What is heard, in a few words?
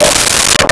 Cuica; Nintendo; July 2020 Gigaleak